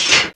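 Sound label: switch; East West - Global Sounds; ELEC.PRCS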